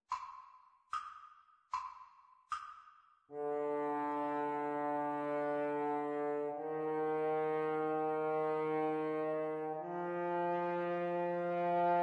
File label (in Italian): duetti per corno